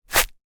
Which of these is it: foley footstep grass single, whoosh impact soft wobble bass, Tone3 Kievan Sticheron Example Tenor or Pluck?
foley footstep grass single